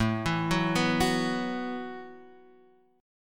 A 6th Suspended 2nd, guitar; A62 Chord {5 7 4 4 7 X} arpeggiated; JGuitar

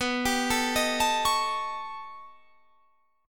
B Augmented 9th B, chord

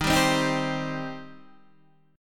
C Minor D sharp

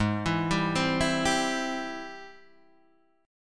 G sharp Augmented Major 7th G sharp Guitar DADGAD

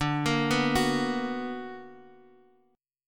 B Minor Major 7th

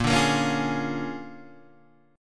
A sharp 7th Sharp 9th A sharp down Guitar Open G

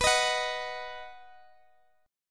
B-Major B down Guitar Open D

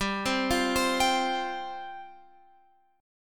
G 7th Suspended 4th G down Guitar Open F; Listen to G7sus4 arpeggiated; G7sus4 Chord

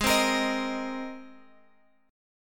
G sharp Major Add 9th G sharp, Guitar Open G

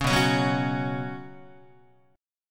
B Suspended 2nd B, Guitar; Bsus2 strummed; 4A,4E,5A,5D,5G#,6C#; 4,7,4,4,5,5; JGuitar

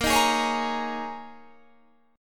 G sharp Suspended 2nd Suspended 4th, guitar chord

